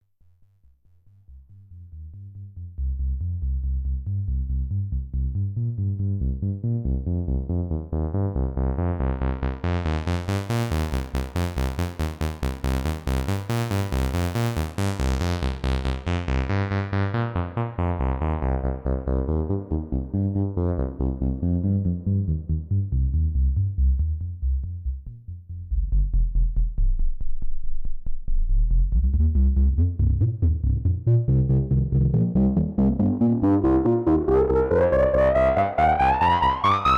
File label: doepfer a 121 a 138j